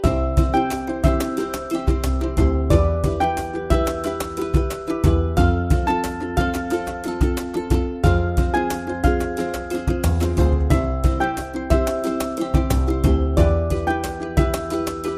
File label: Ukulele Song; Hold Music